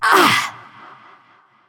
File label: Grunt Jump; Paladins; Princess